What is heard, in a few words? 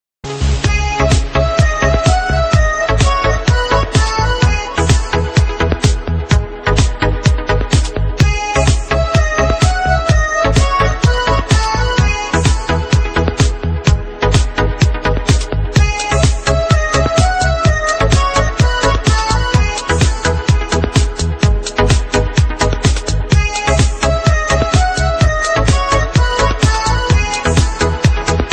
3D Stereo Tone